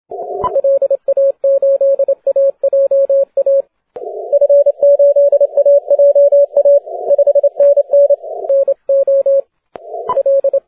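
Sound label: 30m continuous wave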